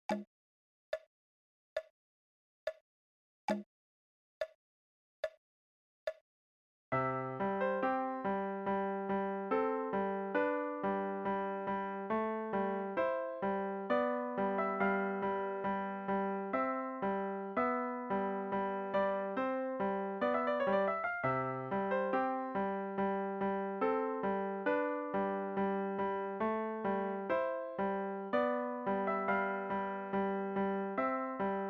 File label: Raindrop Prelude